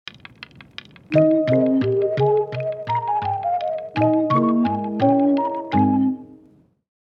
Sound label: regional jingle